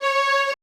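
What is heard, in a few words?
Vibraphone F#C4 R, AKAI Advance Orchestra; AKAI Advance Orchestra Upgrade 97 Vol.1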